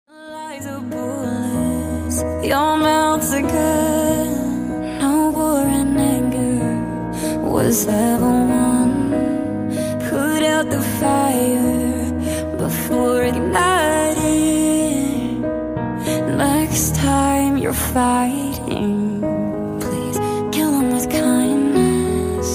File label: acoustic ringtone